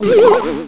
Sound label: gurp; quake2; mnhcoop; battlebrowzer